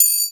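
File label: percussion, Drum & Bass; Ueberschall; Drum & Bass Resonance; 150 BPM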